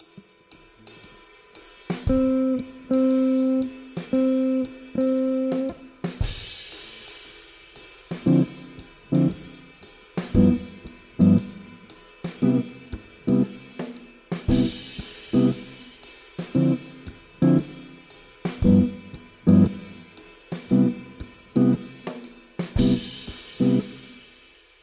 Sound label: audio jazz; ritmos de jazz